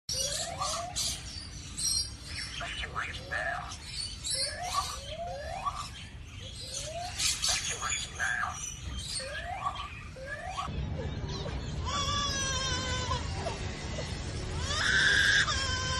This lyrebird sounds like a baby crying and a fire alarm